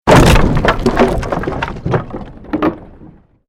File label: created wooden structure crash medium